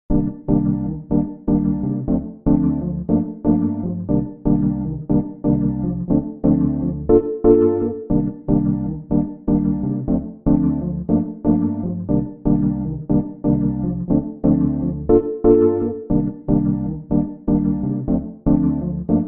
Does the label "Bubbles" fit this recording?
No